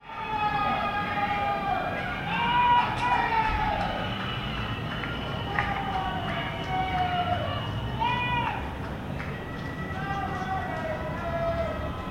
goal

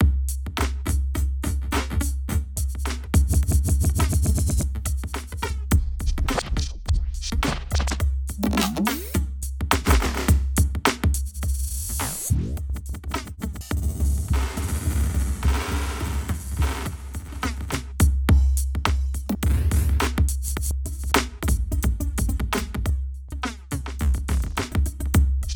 The Madness that Drums can Provide

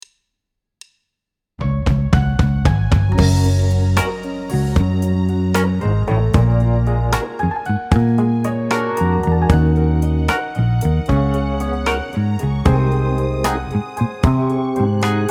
Group Bell

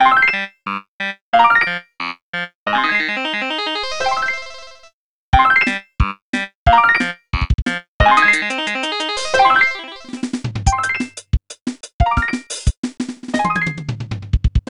Ding ring, Samsung TV

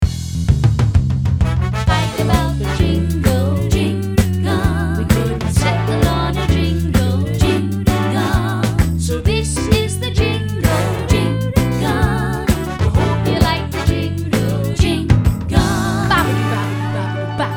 Jingle

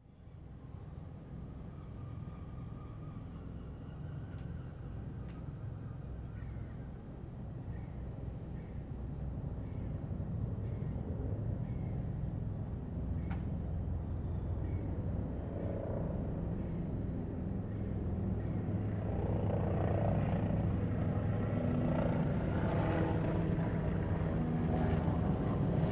Low-flying Helicopter

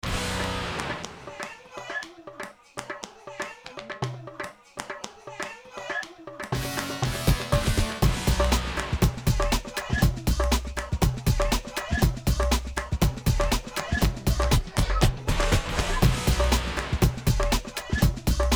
Loop Station

